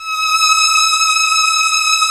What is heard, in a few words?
STR LUSH S, MIDI Section